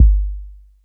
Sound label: Kicks Electro